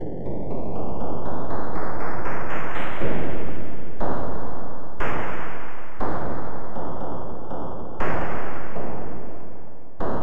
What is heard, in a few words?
Thunder Sheet; Onj's Soundfont